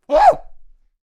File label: Monkey King Attack Vocals